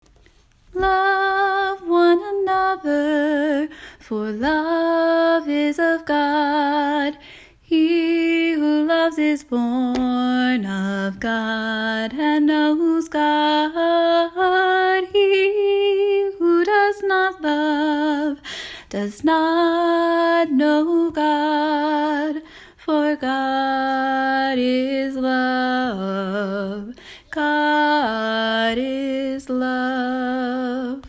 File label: Alto